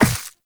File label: walk ice, footsteps; Warframe; Characters; Operator